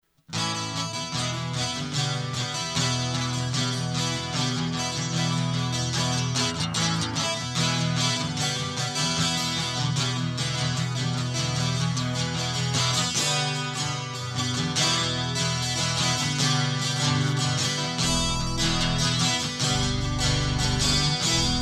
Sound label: Guitar Reprise; Green Eyed